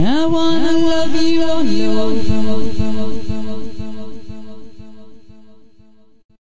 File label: i wanna love you all over, female singing; VOCALS and SPOKEN WORD